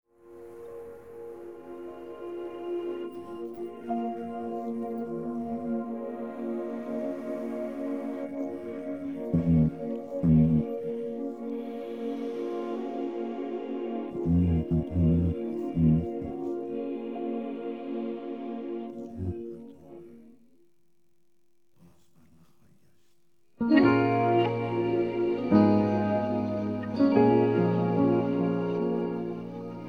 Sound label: instrumental, persian